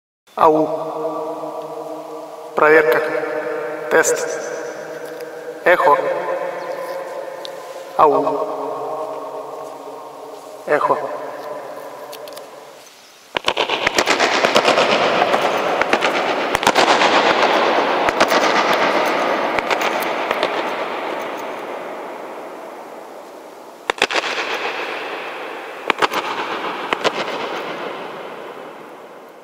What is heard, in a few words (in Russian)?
Test yekha